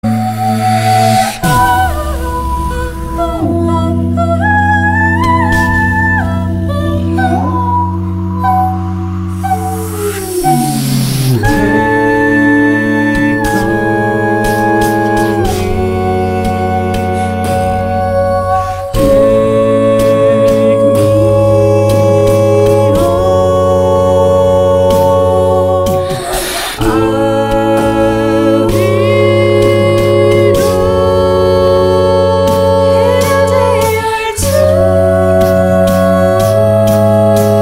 Acapella; The Last of Us

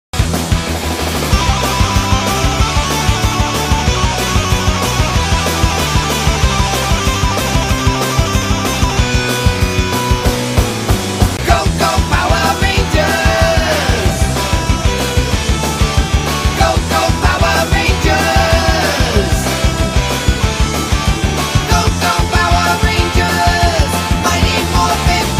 Ringtone; Power Rangers

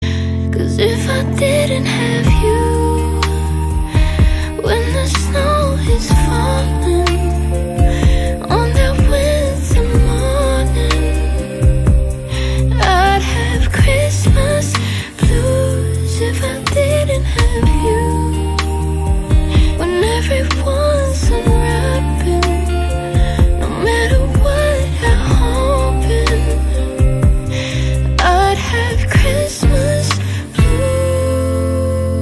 Christmas Blues

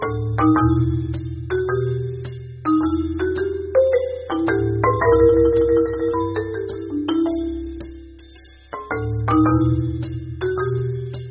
Marimba; The Pink Panther

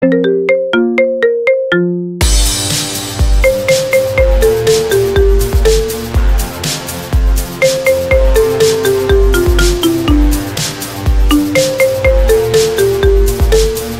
Marimba Remix

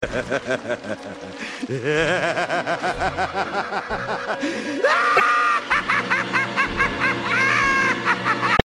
Laugh; Joker

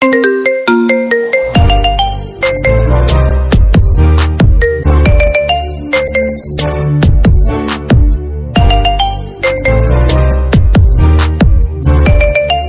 Marimba

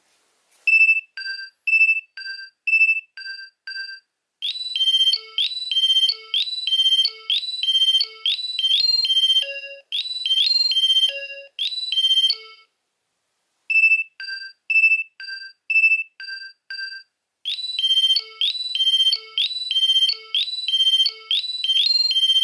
Nokia 2300 Ringtones

